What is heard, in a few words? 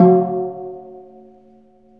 F#2 DBL SEC, STEEL DRUMS; AKAI S6000 CD-ROM; WorldSounds